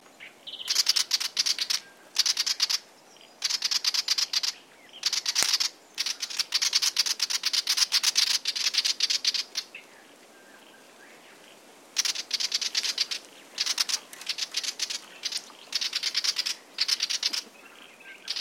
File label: Willie wagtail, پرنده دم چتری ویلی